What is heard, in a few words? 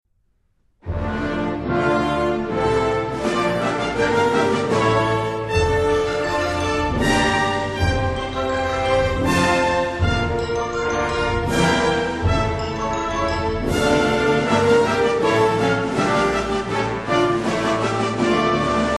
Theme; Philharmonic; Britten; Variations